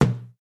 Ball Bounce

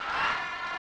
Tile Match (Applause); Shanghai 2 Dragon's Eye; snes

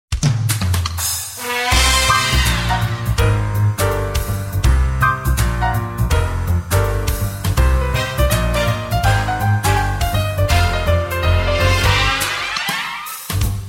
cartoon jazz; Castlevania Resurrection; Dreamcast

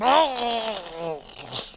Gargle

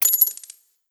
bill pay

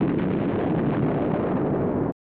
Explosion; Jungle Strike; snes

